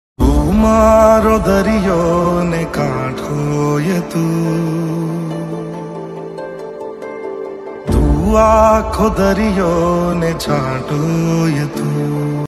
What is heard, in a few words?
gujarati song